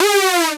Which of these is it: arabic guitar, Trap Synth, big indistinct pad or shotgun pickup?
Trap Synth